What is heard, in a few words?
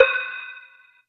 E-Mu Proteus FX Tamblock C5